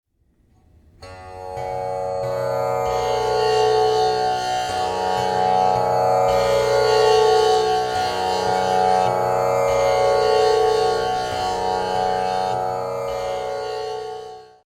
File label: Tamboura B